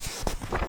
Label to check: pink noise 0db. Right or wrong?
Wrong